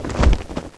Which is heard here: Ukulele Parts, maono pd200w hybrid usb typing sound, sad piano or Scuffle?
Scuffle